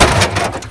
CRASH; Totaled; XBOX

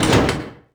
button; Matt Hoffman's Pro BMX 2; XBOX

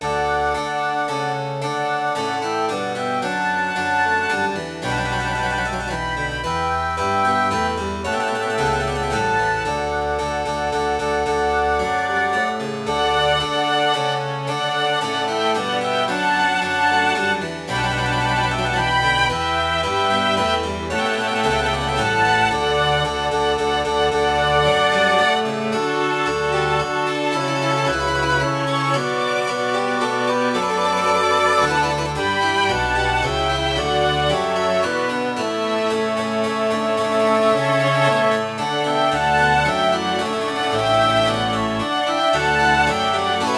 dance normal; Sid Meiers Pirates; XBOX

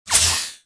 torture droid inject; interrogator

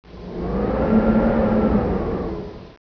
wind; World Racing 2

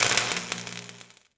spark; electrical sparks

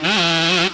sput, Engine

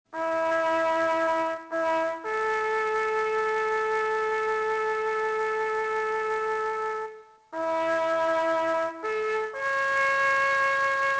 taps; Soldier Of Fortune II Double Helix; XBOX

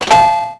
slot machine wheel stops; Max Payne

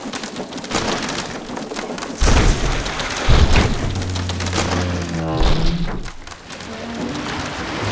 ship dismast; Sid Meiers Pirates; XBOX

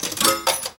coin drop; slots

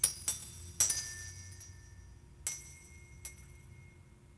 banquet hall chimes; Star Wars Obi Wan; XBOX

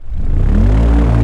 blimp motor start

